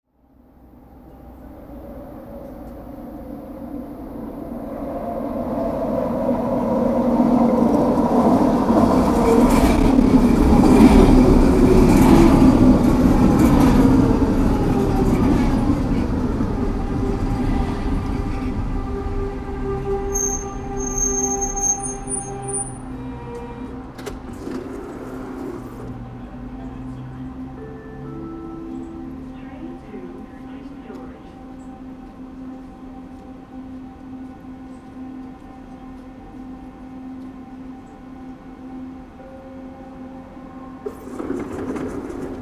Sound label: Royal Oak SkyTrain Station, Burnaby BC, Canada